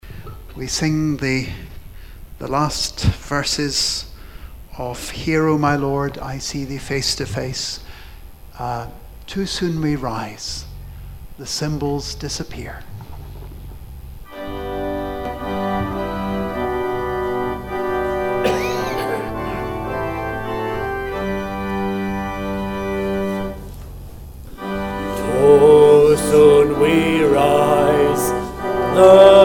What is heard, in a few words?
hymn